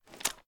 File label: ar reload dmr end; weapons; gmod; viper; scharlie